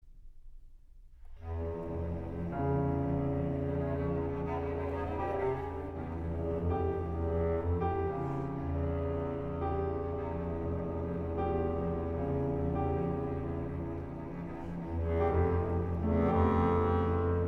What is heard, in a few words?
Bass Clarinet Cello and Piano, Movendo